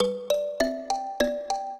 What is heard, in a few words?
trio, mbira; Mozart